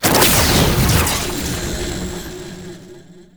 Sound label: Mirror Portal Activation; Warframe; Duviri Murmur